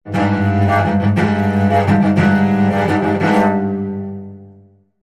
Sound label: Cello Danger Action